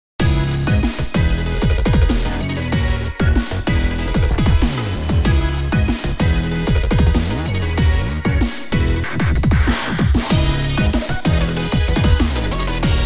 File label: WOBBLE IMPACT